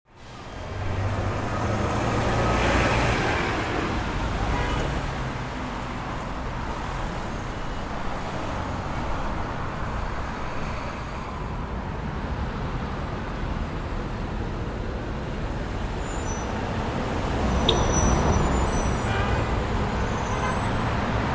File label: City Ambience Sound; crowd